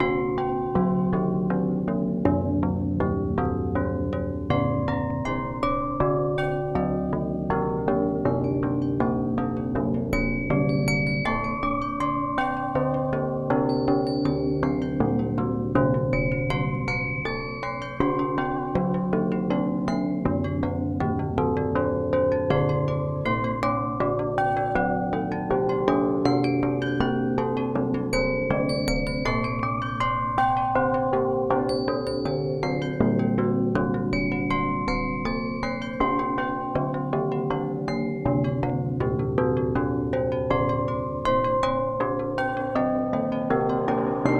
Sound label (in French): Cloches & Trompes; Ambient